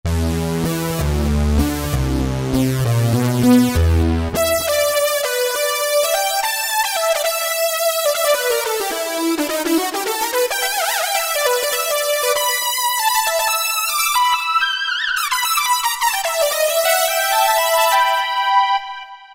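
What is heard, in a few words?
Brass Synth Classic, synth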